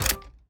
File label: security door payment failed; Counter Strike Model Pack; CS:GO; survival